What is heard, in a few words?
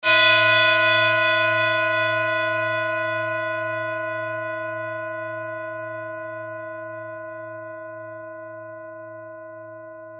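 tibetan bowl, a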